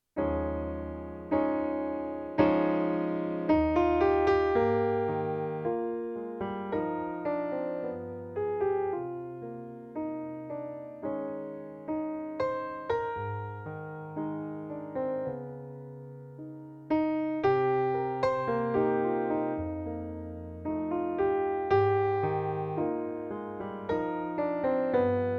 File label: backing track